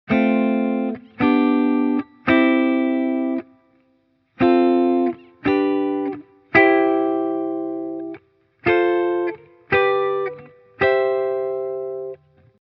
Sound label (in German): Kadenz IV V I